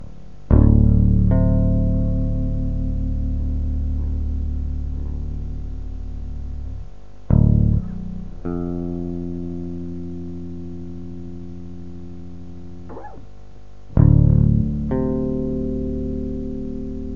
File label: idealized baby bass guitar